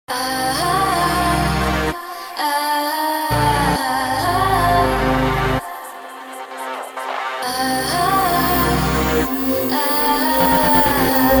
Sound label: ringtone